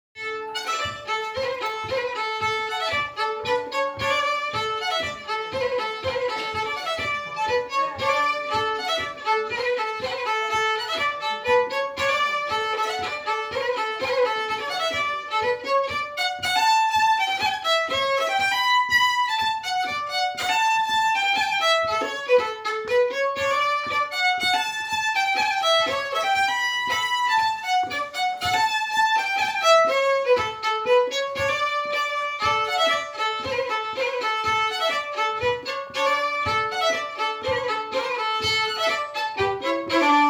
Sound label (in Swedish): Pjäx (polka)